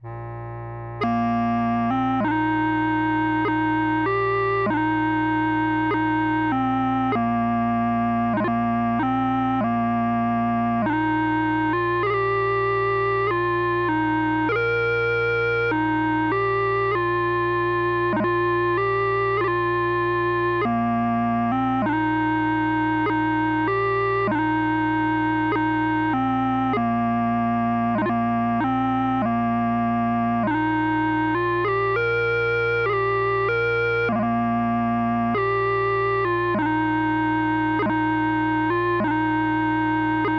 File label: Badge Of Scotland, pipes, 50 bpm; 4/4; Jimmy Findlater